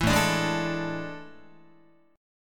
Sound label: A Major 7th Suspended 4th, Guitar; AM7sus4; strummed